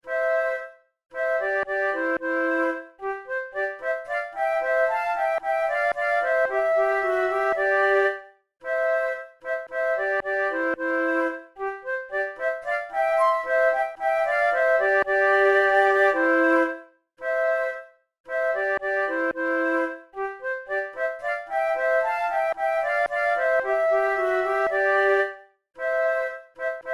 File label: horn duos larghetto; mozart